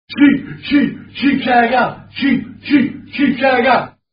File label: Sheep Shagger Football Chant; Neil Razor Ruddock